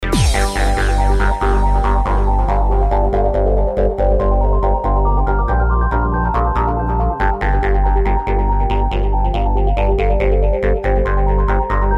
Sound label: Ambient